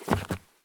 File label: turn wood; Escape from Tarkov